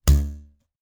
cartoon springboard ruler twang pitched shifted up